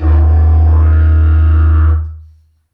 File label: Didgeridoo Kit Samples